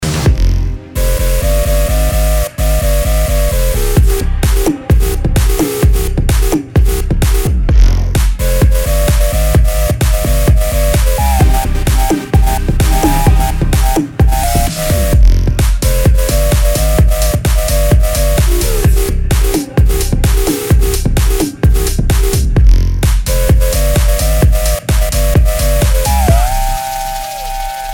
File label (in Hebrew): רינגטון